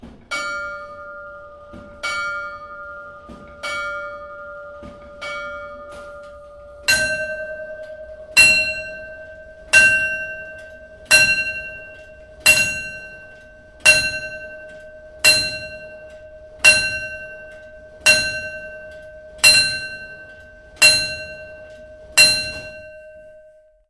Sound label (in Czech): odbijeni hodin; machov